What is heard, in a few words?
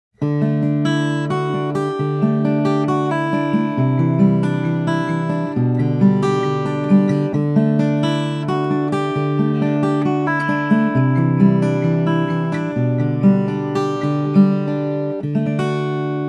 Taylor 912ce fingerstyle